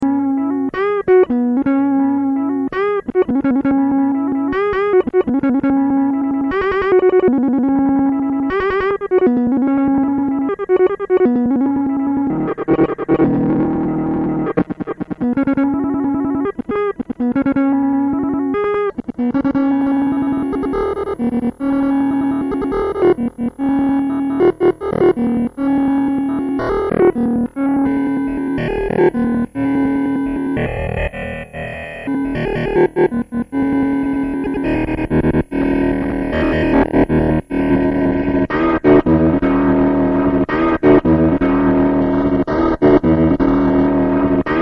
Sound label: guitar